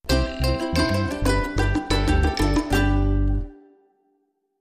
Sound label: Ukulele, Sting